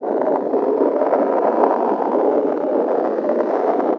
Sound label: wind